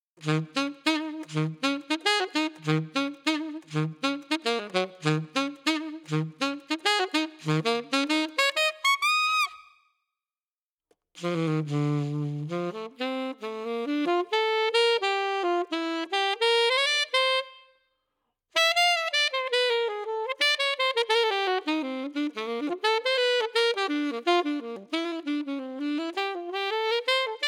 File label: Funk Jazz RB RE20 Reverb, sax